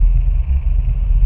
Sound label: wheel roll; The Swapper